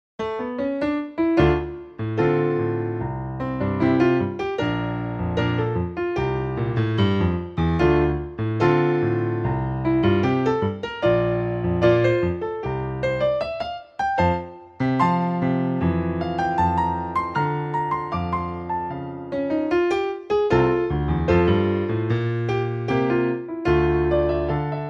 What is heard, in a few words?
Tango